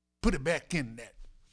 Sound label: Vocal, 70s Analog Funk